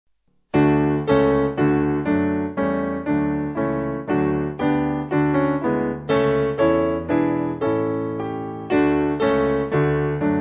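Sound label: Piano, church music backing; God Has Spoken; Deerhurst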